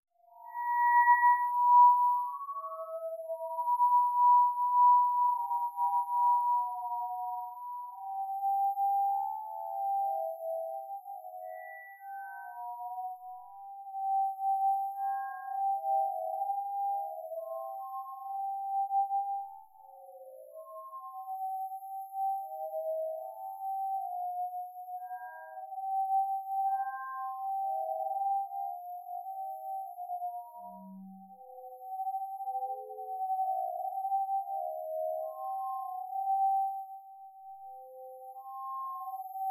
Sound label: rope spectral tracing; Women's Song with Spectral Tracing; Michael Norris